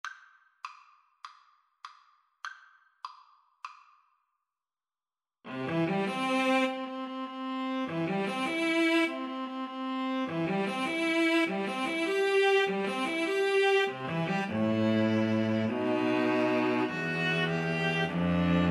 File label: wedding march, String trio